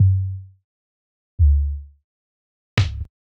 Kick, Trap

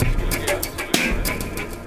VFX2 Loop 128 BPM

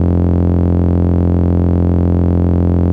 Root F, Bass; Vengeance Samples; Vengeance Ultimate Bass

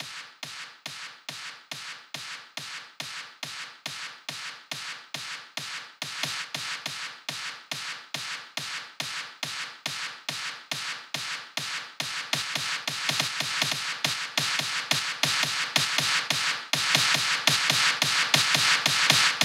140BPM SNARE 16 Bars; Vengeance Samples; Snares FX Pairs

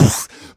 Beatbox Snare, Human Beatbox; Vengeance Samples; Special Sounds

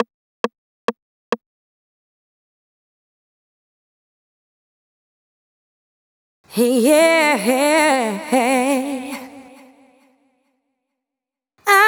Adlibs FX, 136 BPM; Vengeance Samples

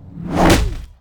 FX Impact; Vengeance Samples

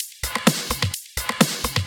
Electro Loop 128 BPM; Vengeance Samples

